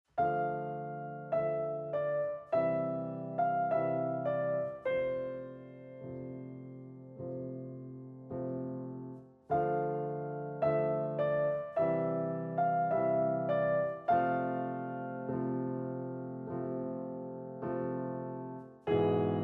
Piano

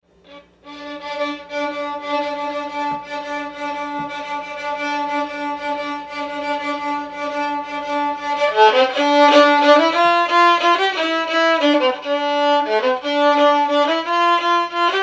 Violin Pirate Song

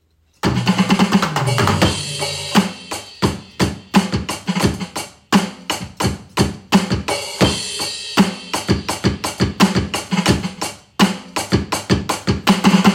Drum

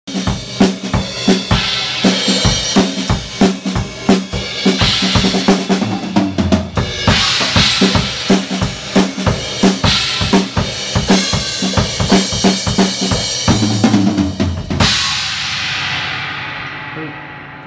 Drums

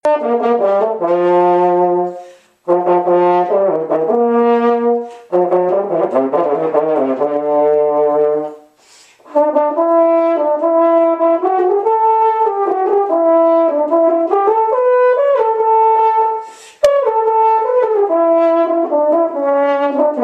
French Horn

